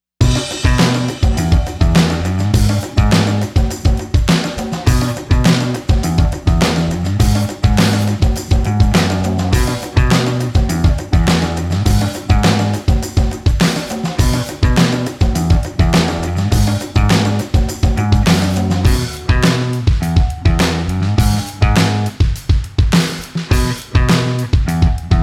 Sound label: Drums w Bass Uncompressed